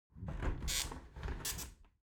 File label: object window close soft sequence; smartsoundfx